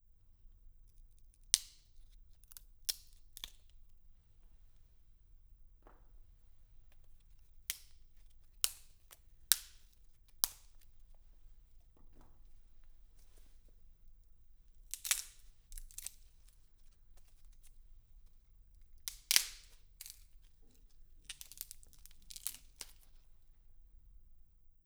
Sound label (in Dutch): Krakende takken